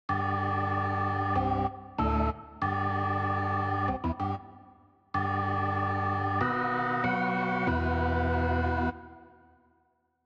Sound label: organ